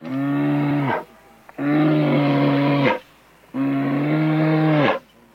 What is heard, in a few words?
bear